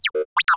astromech confirmation

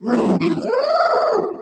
wookiee m attack

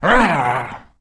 afflicted f attack

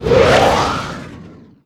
narrow escape

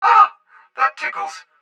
droid target droid

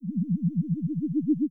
senatepod engine accel

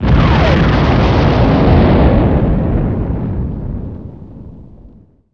explode xwing

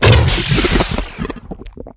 item webber trap

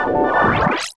ui tech use

